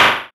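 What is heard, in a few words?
impact bullet generic titan shield; Titanfall 2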